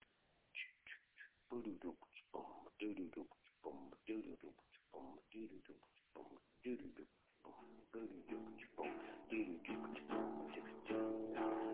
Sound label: sultry with wild west epic